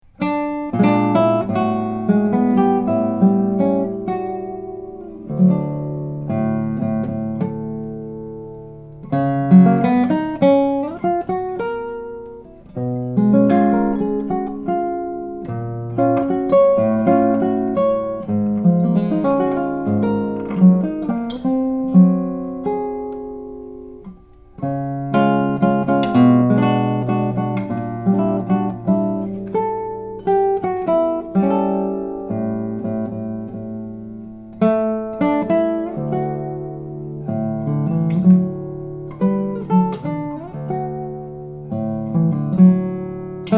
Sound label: unibody guitars; classical guitars; ennis anthony m